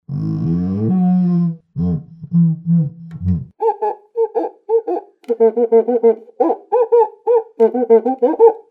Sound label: cuica